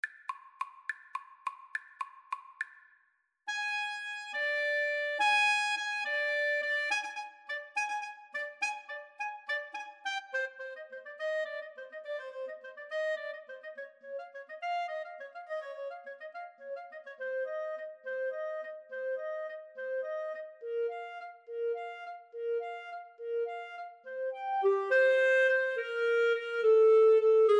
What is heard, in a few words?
clarinet saxophone duet